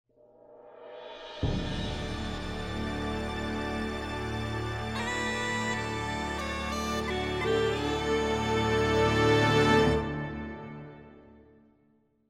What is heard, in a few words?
Music; Microsoft Studios; Age of Empires III - Complete Collection; cinematics